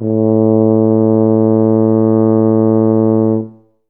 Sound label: tub A2, Tuba; Best Service ProSamples vol.51; Classic Orchestra 2